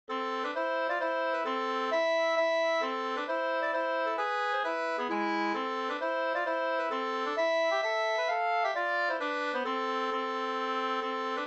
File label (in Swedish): hambopolska, 2st; fr Varnhem nr2 av H Falk